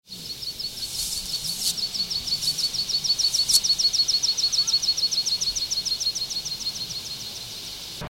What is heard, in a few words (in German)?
bergpieper